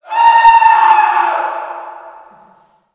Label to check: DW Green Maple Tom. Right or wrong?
Wrong